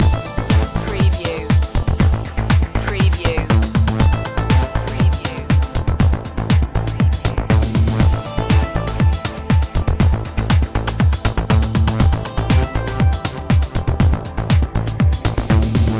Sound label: neutron groove loop